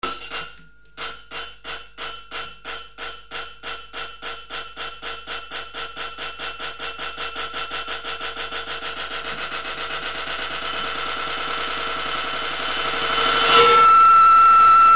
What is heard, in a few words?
instrumental; thrash avantgarde